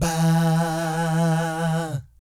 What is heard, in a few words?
VIB FX, Vocal Planet Jazz & FX; ILIO; JAZZCOMBO